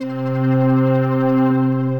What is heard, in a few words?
Ethereal Pads; Contemplation